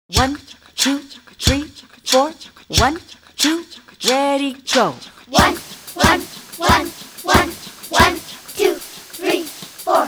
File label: Train Rhythms